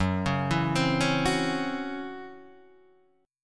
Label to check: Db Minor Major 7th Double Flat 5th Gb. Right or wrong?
Right